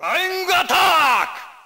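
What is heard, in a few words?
combo hand attack; RedDot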